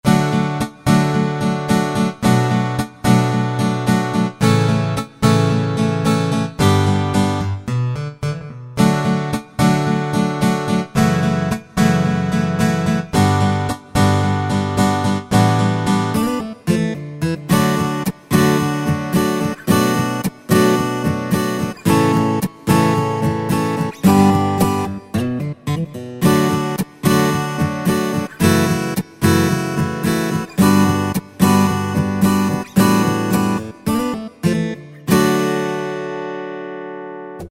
Steel Guitar Demo, Yamaha PSR-3000; Factory Demos; MEGA VOICE Technology

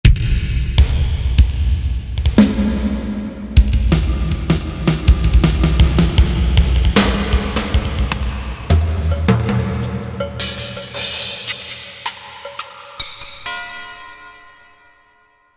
new drums

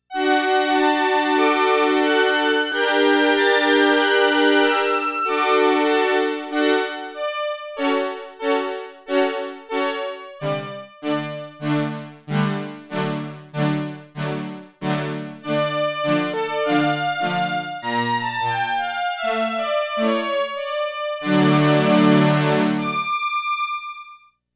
Strings

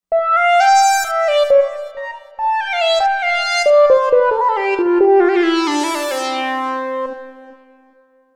Lead Earth Lead

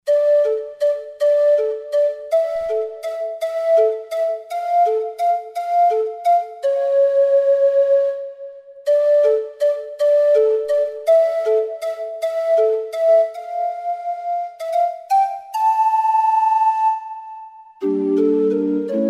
Roland V-Synth Soft Pan Flt; Factory Patches

